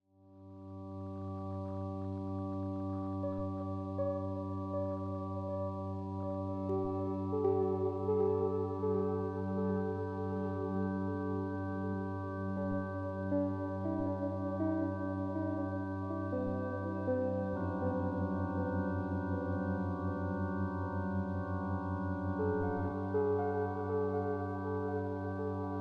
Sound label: Drumor